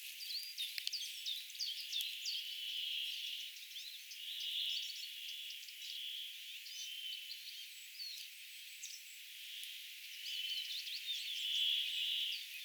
tiltaltin laulua; tavallisen tiltaltin laulua

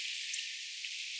ihan kuin oranssisirkku mutta onko silti keltasirkku

